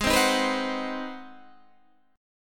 G sharp 7th Sharp 9th G sharp, down, Guitar, Standard; strummed; JGuitar